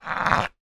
foley glass bottle small bottom scrape concrete ground